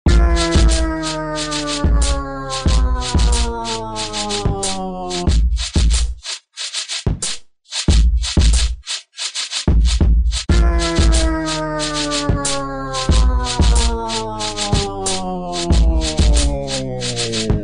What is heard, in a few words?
beat